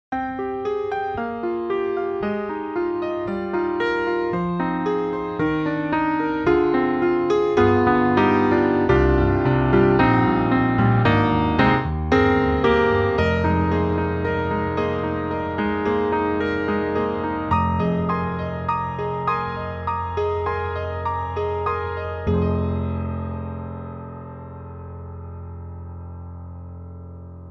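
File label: Smokey Jazz, piano; Soniccouture; The Hammersmith Professional Edition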